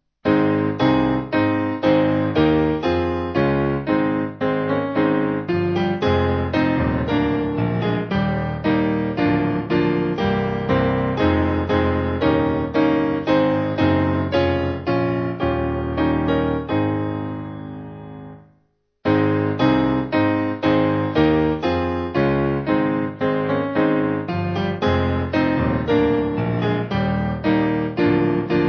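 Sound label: Piano, Music Backing Files for Churches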